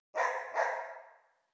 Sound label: amb Dog; netent